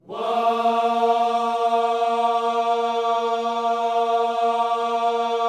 WHOA A#3, Vocal Planet Gospel; Spectrasonics; Vocal Planet Vol.1 Gospel; akai

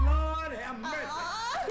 VOC

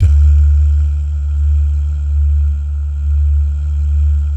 "DAH", Vocal Jazz Fx

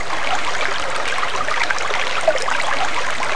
ATMOS

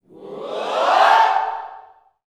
whoops, Vocal Gospel yell shout; Spectrasonics; Vocal Planet Vol.1 Gospel; akai

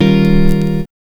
GTR CHD

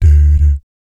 "BS D D", vocal jazz fx

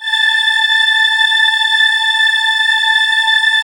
SWIRL

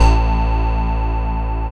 techno; E-Lab; X Static Goldmine 1

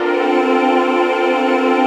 VOICE PAD; Best Service; XX Large; Pads

